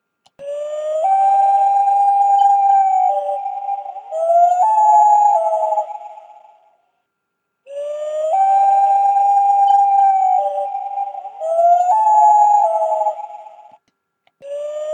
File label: loon